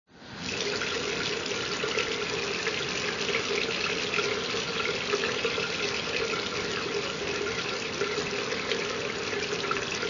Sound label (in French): liquide eau